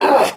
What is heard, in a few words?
Pain, Barks; Kuva Lich; Persona A